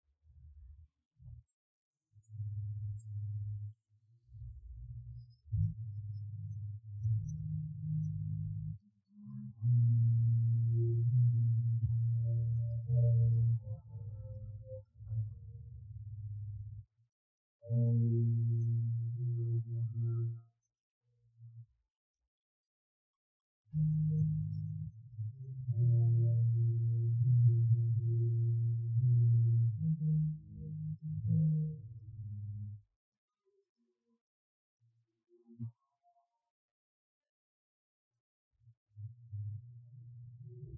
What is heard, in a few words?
Bass